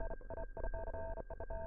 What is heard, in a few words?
quiet morse, Filtered Morsecode Like